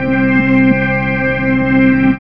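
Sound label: SYNTH MOVE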